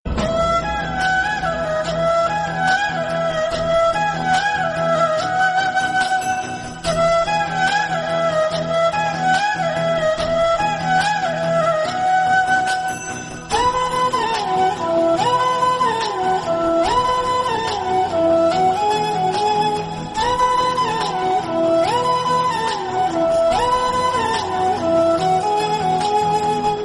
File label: flute